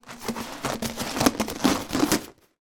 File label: household plastic floor mat roll up